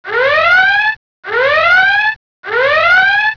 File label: Bridge Red Alert; Star Trek JR Audio